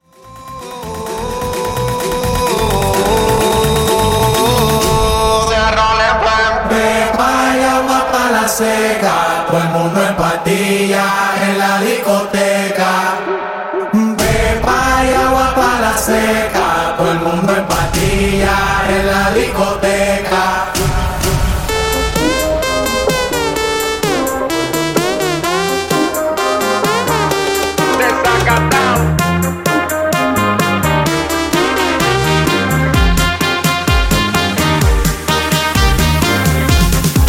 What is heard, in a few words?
Party Segue 128Bpm